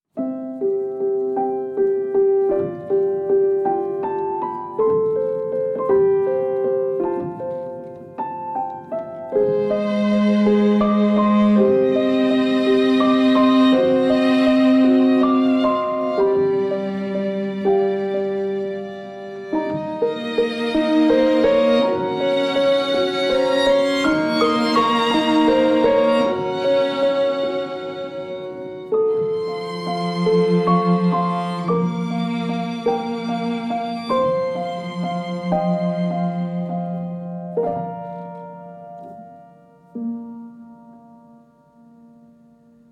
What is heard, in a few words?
Instrumental